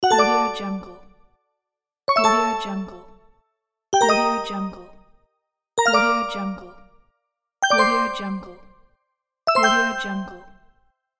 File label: object pick up